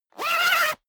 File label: zipper down jacket close fast